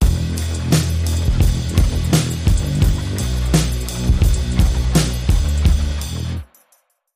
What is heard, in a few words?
Boom Bap, Link Sting